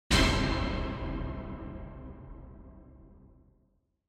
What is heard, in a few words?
Quiz Question Reverb; クイズ 出題01-2(リバーブ)